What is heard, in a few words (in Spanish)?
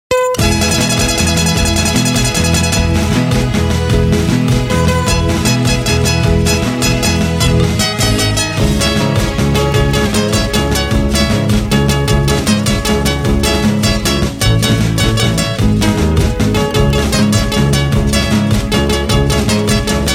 pista E